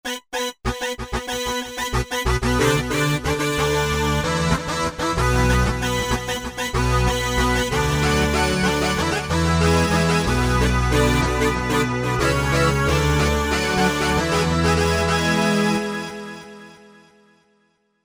2 voices; Korg MSC-12S Synth 3

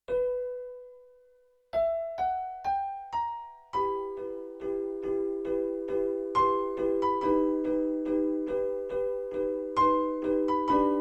Melancholy Piano; Yamaha TX16W; Jazz Performance; Jazz Performance 2

